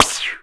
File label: PSSEW, VOICE; Rarefaction - Poke in The Ear With A Sharp Stick